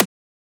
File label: Transient; FLPRO